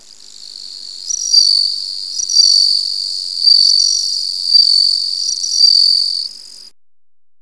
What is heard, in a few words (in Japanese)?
suzumusi, insect; tenjin